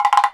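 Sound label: WOODBLOCK; ILIO; Synclavier Percussion 2; World & Orchestral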